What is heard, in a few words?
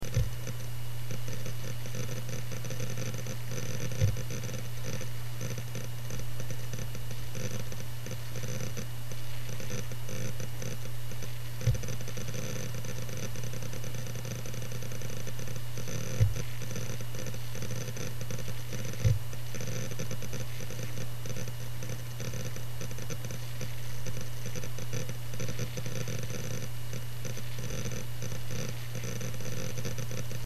seagate small, hd; hardware